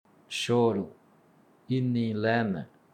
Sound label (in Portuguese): choro